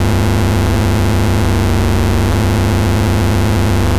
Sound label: 2 step sound block